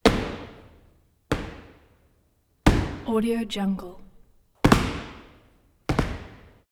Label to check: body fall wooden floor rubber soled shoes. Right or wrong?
Right